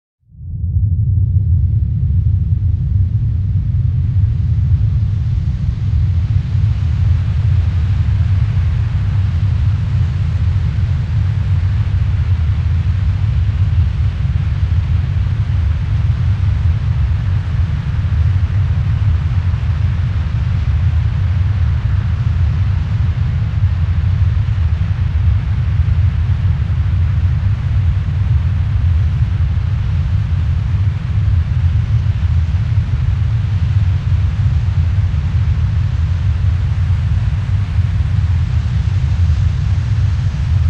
Shod snezhnoi laviny

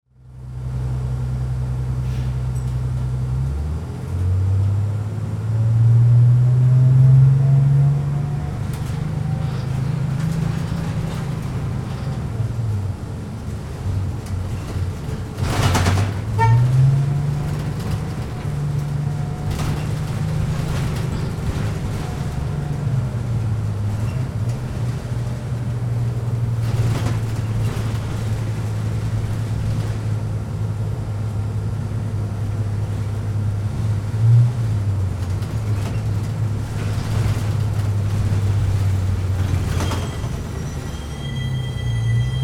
V avtobuse

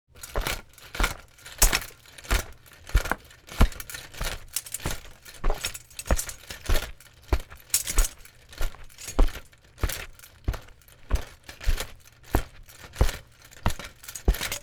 Ryccar v dospehah latah

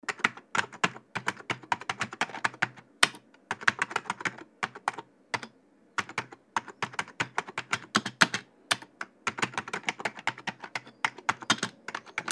Klaviatura kompjutera pechatajut

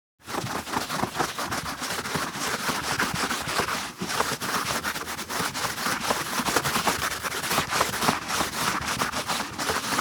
Stiralnaya doska

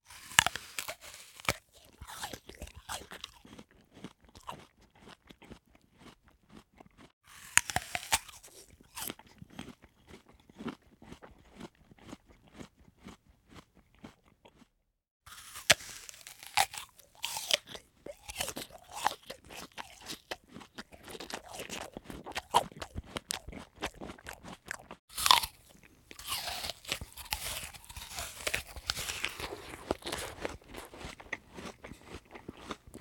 YAbloko otkusyvajut edyat chavkajut

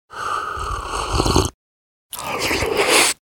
Vtyagivajut goryachii napitok

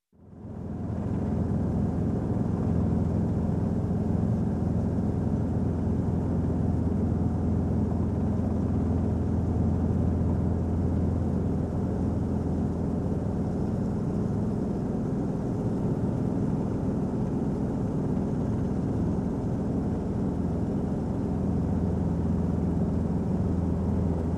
Vnutri vertoleta

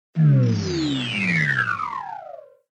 Porazhenie

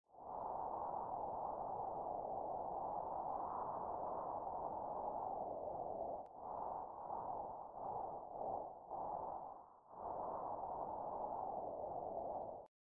Rakushka

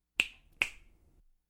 SCHelkajut palccami

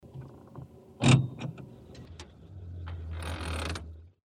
Korobka peredach

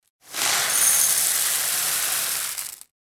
Vysypajut hlopya v tarelku

